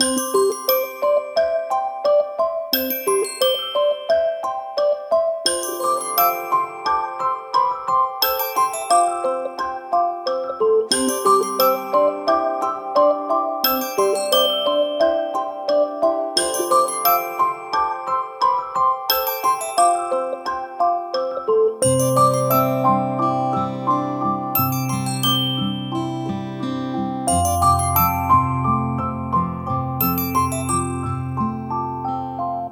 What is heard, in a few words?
Melody, ringtones